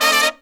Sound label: Pop & Funk Brass